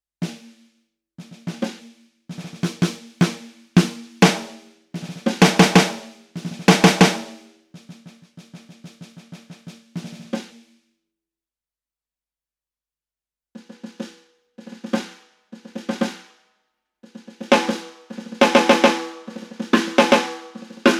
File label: Snare Drums